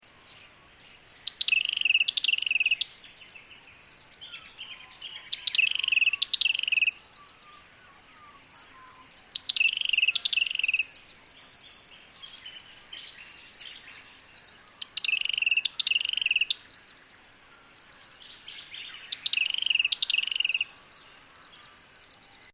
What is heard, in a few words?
red capped robin